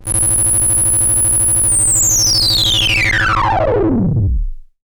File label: Monotron Twitchy Downer